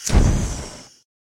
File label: Turbo Boost Flame; Lethal Company